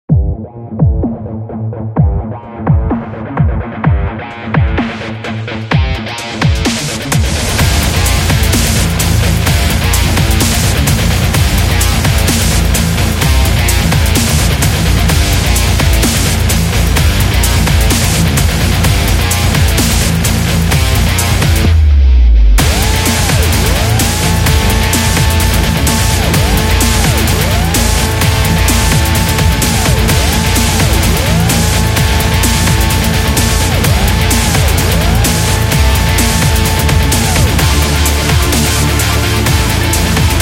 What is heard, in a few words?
Agressive Sport, metal